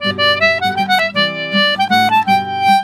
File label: Celtic Flavours; USB Soundscan vol.42; AKAI